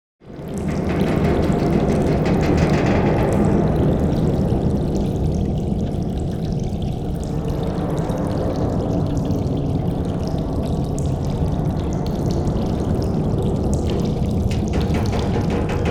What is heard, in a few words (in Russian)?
Submarina dala tech